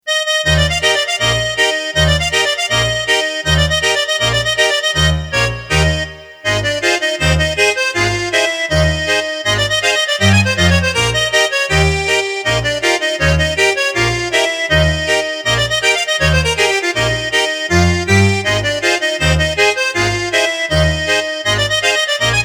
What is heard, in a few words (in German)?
Klarinettenzank